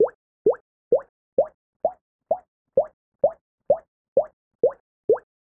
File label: Drop, notifications; OnePlus OxygenOS 13; dynamic notifications